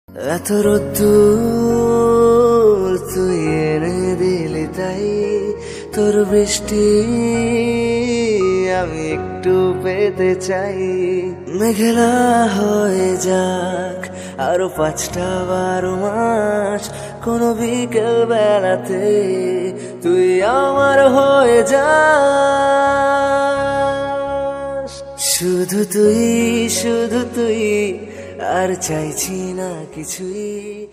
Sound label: Romantic Bengali Song Ringtone; ringtones